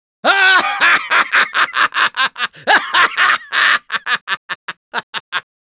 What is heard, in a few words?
laugh long, es